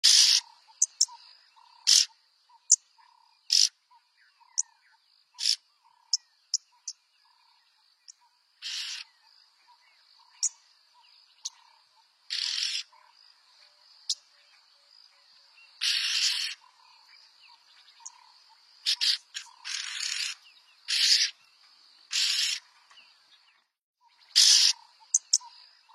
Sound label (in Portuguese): canto do pica bois de bico amarelo